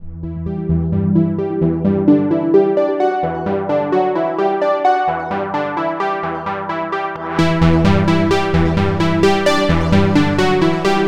ambient

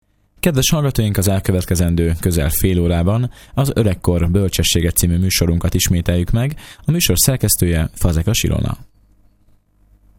Jingle; Az Oregkor Bolcsessege

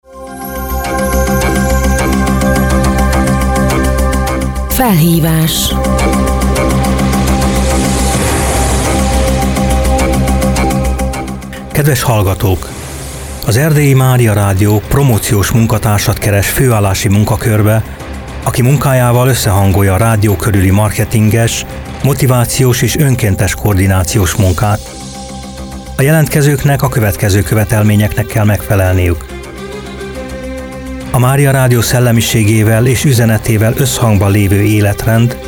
jingle promocio

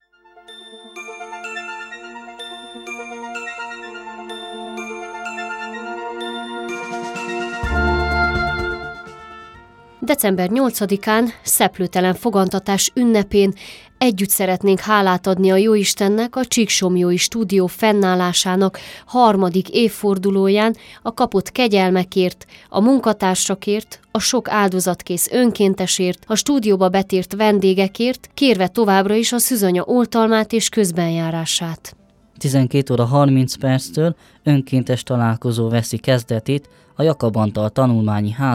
Jingle; 3-ik szulinap; STUDIO CSIKSOMLYO

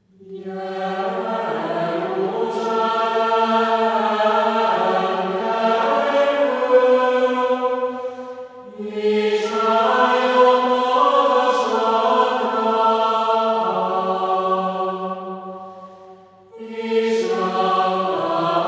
Gregorian chants; Advent 1; Adventi enekek

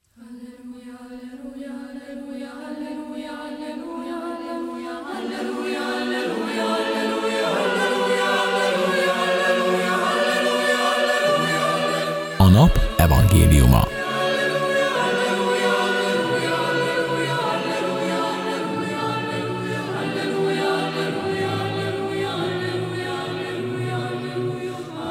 Jingle; Jingelek es alafesto zenek

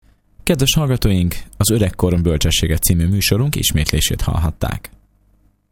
Jingle; Az Oregkor Bolcsessege

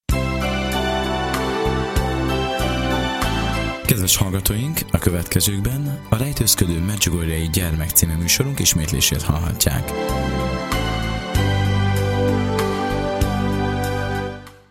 Jingle; A rejtozkodo M Gyermek; A rejtőzködő medjugorjei gyermek

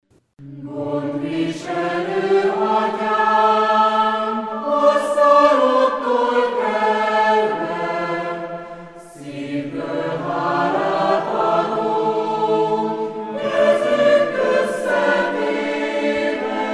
Jingle; Asztalt teritunk vege

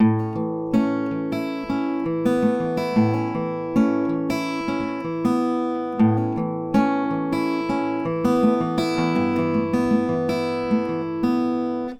acoustic guitar

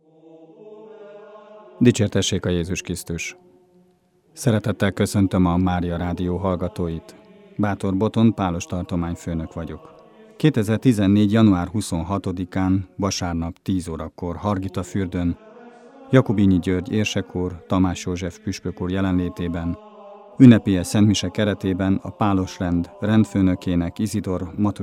Jingle; STUDIO CSIKSOMLYO

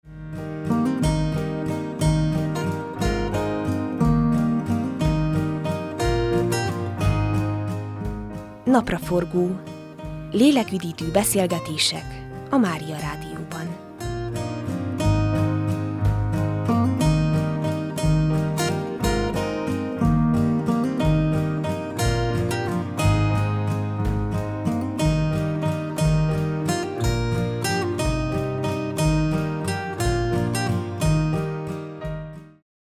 jingel; Napra-forgo

